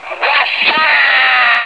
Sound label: intercom